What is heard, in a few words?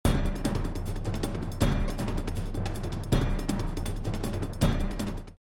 storm drum